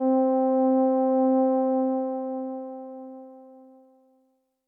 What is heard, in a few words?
Pad C, Synth Prophet One Shots; Electronisounds